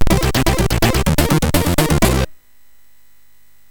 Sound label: Jingles, MOS6581R3; hvsc